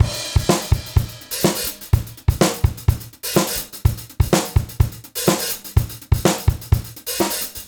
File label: 125, Beat Loops; Carnage; Zero-G - Monster Beats